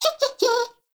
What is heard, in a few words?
baby vocal singing; Nokko; Warframe; Nokko Colony; Find Nokkos